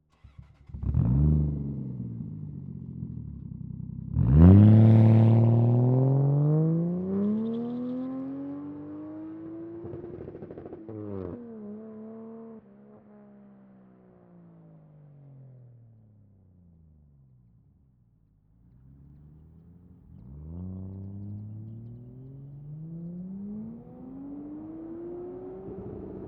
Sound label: Toyota Chaser Mark 2 BOOM start idle very fast drive away and very fast appr; FLYSOUND